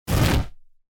whoosh master more control

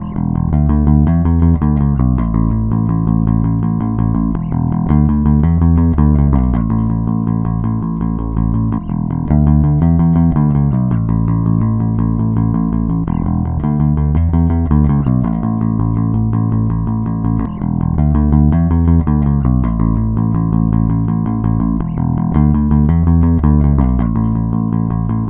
bass; alesis io dock

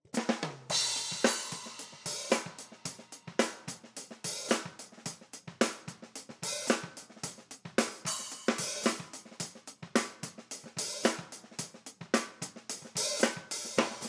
hihat normal

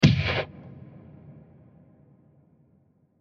gun dry

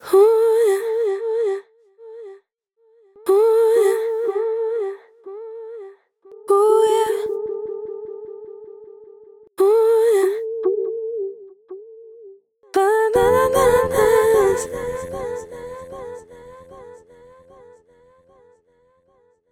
delay; xils lab lemasque; Delay-Plugins

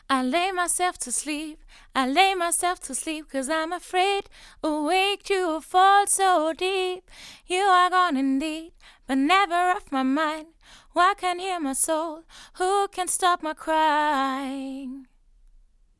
vocals; mxl 9090brt

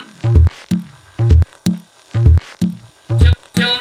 expelectronic 126

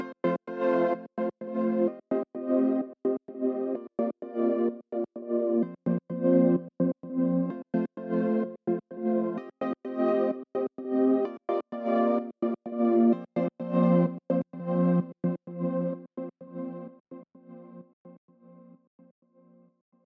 PEDS ARP WET

